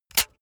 razor retract comb fast; maschinen; rasierer; soundpool

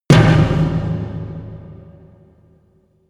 huge tom hits; music elements; percussion

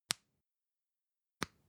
lipstick close soft; haus-garten; badezimmer

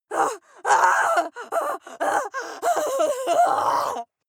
female lunatic scream fear; schreie; menschen

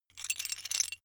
metal pieces clatter short

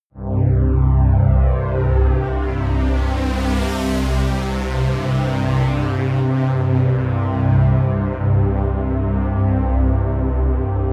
pad sweep flanger; flaechen

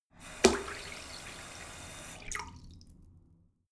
sink fill splatter medium short; haus-garten; badezimmer

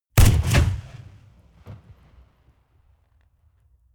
rifle ak-47 exterior fire single bass; comedy-cartoon; Pistols and guns; pistolen-gewehre

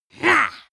female attack

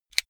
car flip key eject

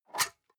storage lid slide open medium, box; objekte

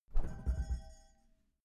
glass door close soft glass clang

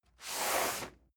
cardboard box medium slide; objekte

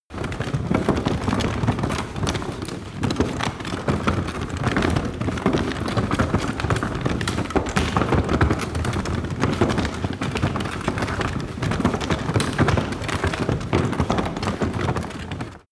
cart medieval drag; kutsche; verkehr transport

